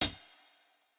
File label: hihat, lofi hiphop; Legendary lofi hiphop